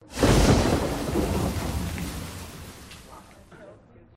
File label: rain; gwent classic v4.0